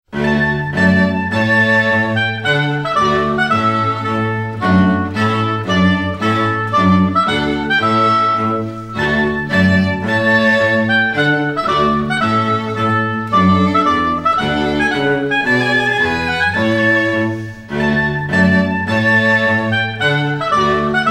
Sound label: trumpet tune; Classical Trio